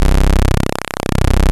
PULSE MOD; E-MU Formula 4000 Series Vol. 3; Analog Odyssey

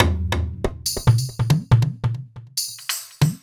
Addon Loop, Drum Loops 140bpm, Perc Loops

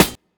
KIT, Dance Mega Drums, HIP; 90 s Sample CDs; Best Service

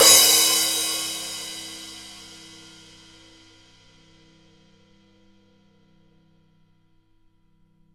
P Crash up; Digital Sound Factory

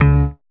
Synth Bass, Roland

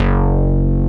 SELECTED BASS; Masterbits; Dance Trax

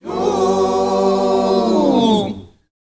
TOOOO END, Heart of Africa, CHOIRS; Spectrasonics

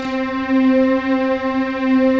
PLUCKER KEYS, pads; Pro-Rec - Synthsonic; TR PADS

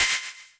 Percs, Elektron MachineDrum; SPS1 MKII

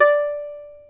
D4 HASAPI; AKAI S6000 CD-ROM; WorldSounds

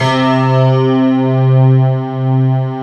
piano pad C3

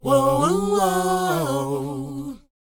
WHOA C#, Vocal, R&B & Dance; ILIO; Vocal Planet

